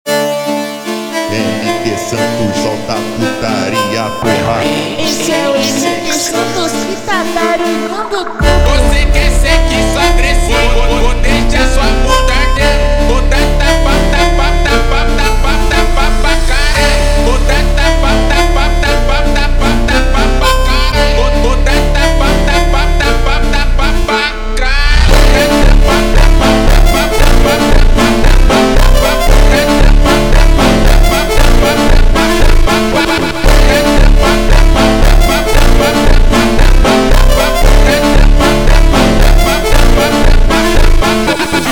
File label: Montagem slowed